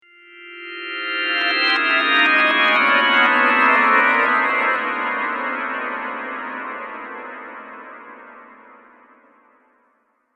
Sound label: Haunted Chimes, Scary Tone Sound Effects; horror